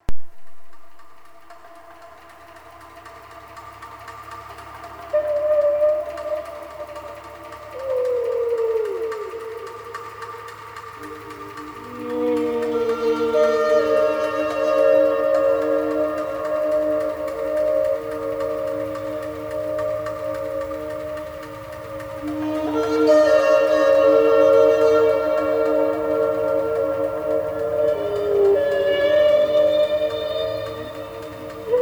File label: song percussion whale